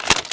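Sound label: weapon hud off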